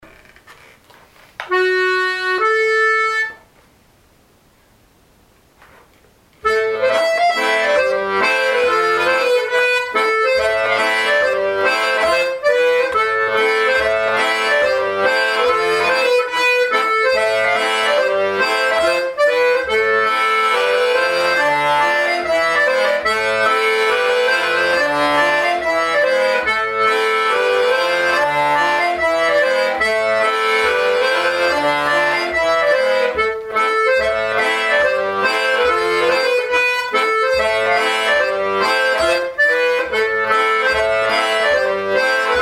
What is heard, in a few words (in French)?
laridé bro gwened, accordeon